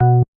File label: bass, programmable speaker